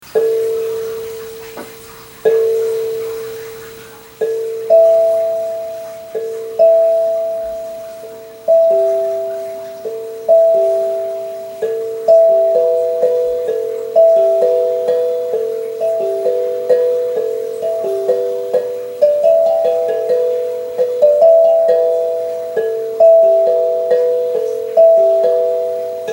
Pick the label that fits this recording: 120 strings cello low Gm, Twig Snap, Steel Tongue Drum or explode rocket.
Steel Tongue Drum